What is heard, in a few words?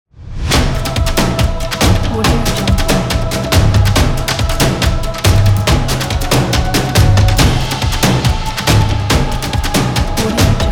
sport soccer football drums